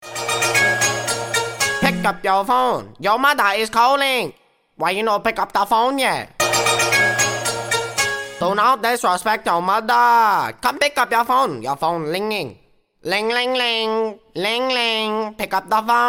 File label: your phone linging ringtone